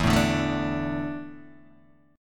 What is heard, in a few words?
E 7th Suspended 4th, Guitar, DADGAD